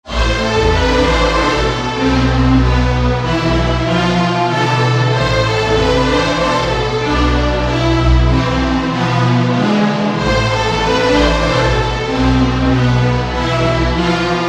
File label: Lead; Routed Sound; Spire Expansion - Street Vanquisher Vol.1